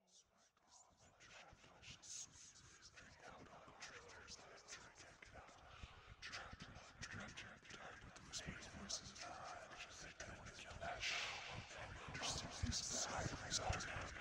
sussurri horror effetti sonori horror inquietanti

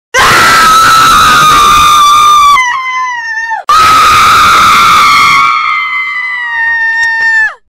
urlo aaaaaaaaa scream

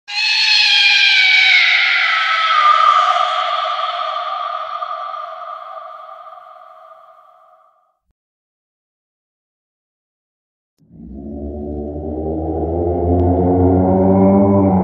suoni horror scary cat effetti sonori suoni paurosi creepy sound

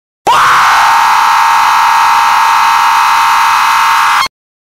urlo fortissimo effetto sonoro

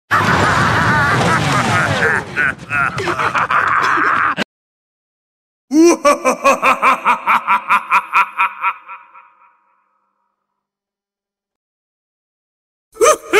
suoni horror risata malvagia inquietante creepy sounds horror sound laugh

